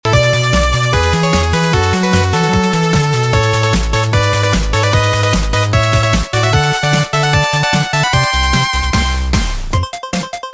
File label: Bonus Stage Triumph Victory